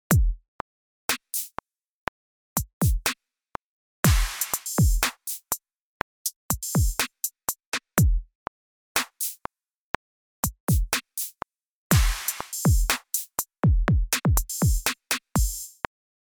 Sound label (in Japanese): Electro Trap, 自動で作成されたドラムパターン; 電子系のドラム; Logic Pro 11; Session Player